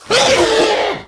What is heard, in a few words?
CLAW; offspring; giants